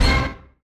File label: funny orchestra hit (c)